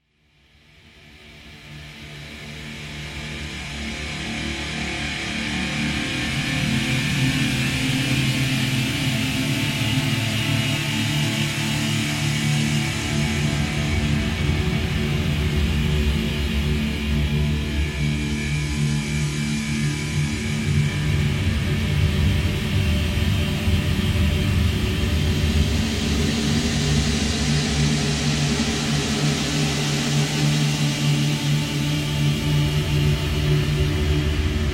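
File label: background sound serious moment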